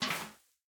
empty powder snow, bucket; Minecraft

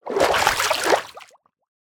swim, liquid; Minecraft

